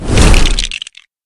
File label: lego creation; The Monster Plane